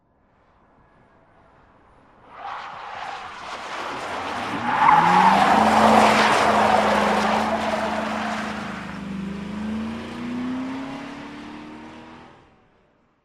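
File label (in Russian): заносы машины на мокрой поверхности или в снегу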